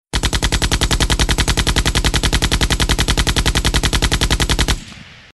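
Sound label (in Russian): pulemet, machine gun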